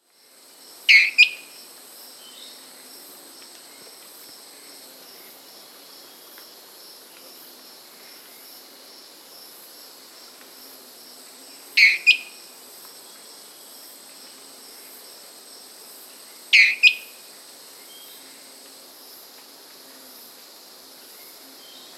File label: Dwarf Tyrant-Manakin, Tyranneutes, Nature's Songs, S. America